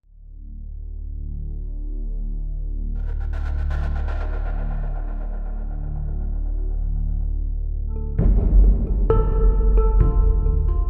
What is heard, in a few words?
Ambient Drums; Frederic Kooshmanian; Stephane Larribat